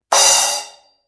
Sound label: Choke